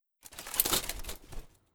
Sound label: Armour; Legend of Keepers; Godess